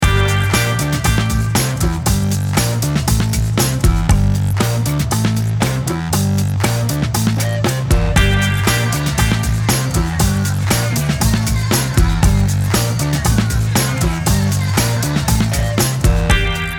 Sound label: Instrumental